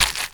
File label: octo steps; Garry's Mod; tf2